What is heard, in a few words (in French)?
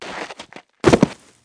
Tombe; El Rey Arturo